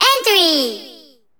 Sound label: SE GAME SLIDE; maimai